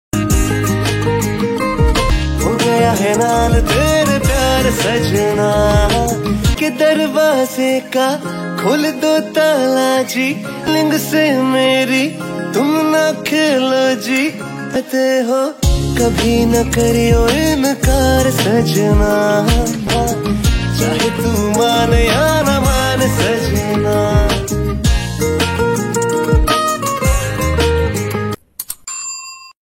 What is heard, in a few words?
ringtone